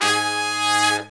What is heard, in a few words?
BRS SHRT, R&R Horns, Sect; Roland L-CDX-03